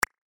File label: notification beep sound; Xiaomi Mi-CC9